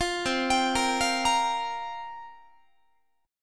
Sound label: C 7th Suspended 4th, Guitar, chord; C7sus4; arpeggiated; 4A,4E,5A,5D,5G#,6C#; 20,20,22,20,21,21